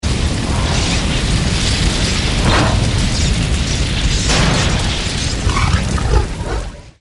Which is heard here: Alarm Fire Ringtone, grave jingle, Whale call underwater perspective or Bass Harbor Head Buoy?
Alarm Fire Ringtone